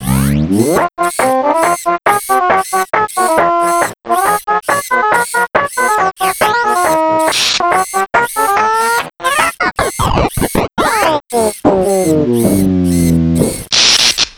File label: mounted fish song; tf2